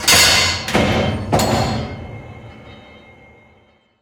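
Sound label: Workshop Press; Prison Architect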